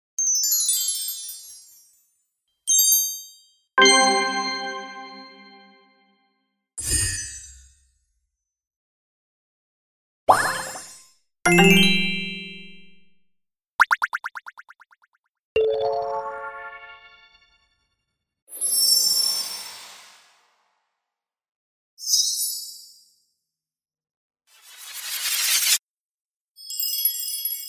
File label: dazzle, spells